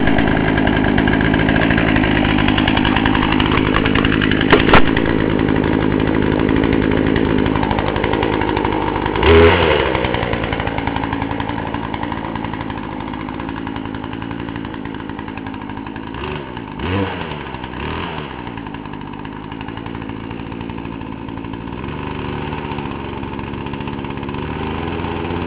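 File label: Chainsaw Raw; scary sound effects; making scary sound effects with sony's sound forge audio studio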